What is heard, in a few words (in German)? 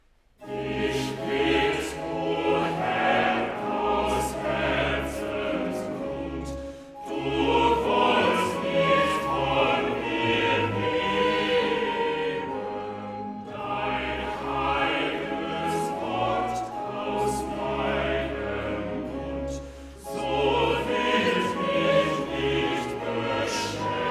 Choral; Cantata, BWV 18 'Gleichwie der Regen und Schnee vom Himmel fällt'; 'Ich bitt, o Herr; Bach; Southwell; Sexagesima